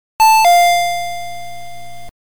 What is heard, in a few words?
ping-pong